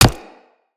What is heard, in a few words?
Player Bullet Impact Flesh; Weapons; Bullet Impacts